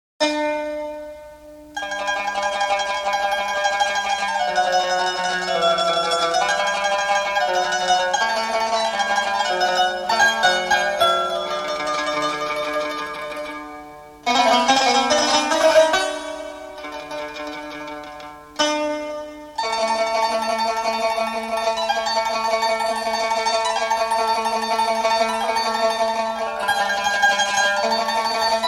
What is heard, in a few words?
instrum oriental